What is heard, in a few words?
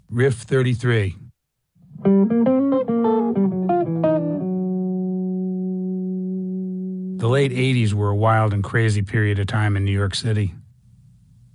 Riff